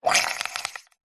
foley liquid water air bubbles surface shallow short